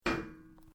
METAL GIRDIR MOVE; Materials

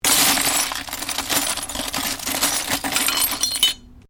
Glass shards movement

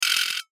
Rollover Rattle Short; Mouseovers; Multimedia; Blastwave

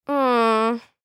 Human Groan; Groan Element Girl; Pain; Blastwave

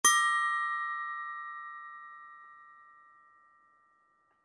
Accent Glockenspiel Chime; Accent Glockenspiel Chime Light Eerie; Mallets; Blastwave

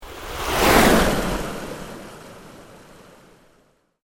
Sweep Organic Slow

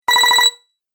Game Collect Your Coins, Video Game Sound Effects

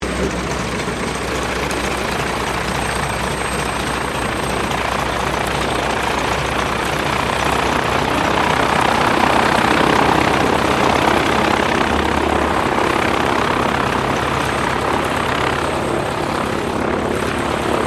AIRCRAFT STINSON RELIANT SR-8C TAXI; 1935; Airplanes; Vehicles

